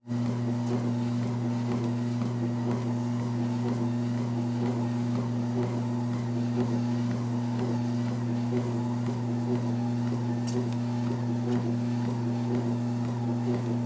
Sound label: Treadmill Walk One Person; Sports